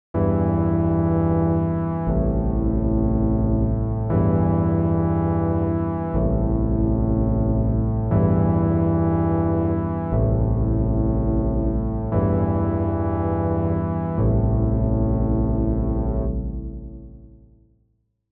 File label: Am 120 synth chords; Cold Circle